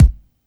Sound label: rich mid range bass drum one shot c key